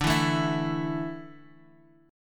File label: D Suspended 2nd, Guitar; strummed